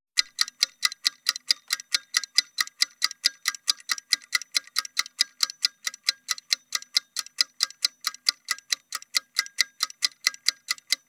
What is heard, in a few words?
timer clock ticking